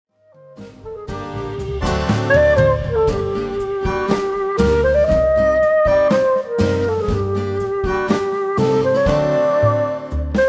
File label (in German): Tango Klarinette